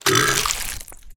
maneater hurt, utterance

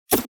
separated third swing, abilities

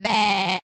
goat calm, utterance

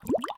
running water, river sounds

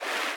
fast water, river sounds

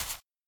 snow step, footsteps

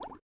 running water, river sounds

